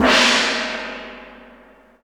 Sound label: gong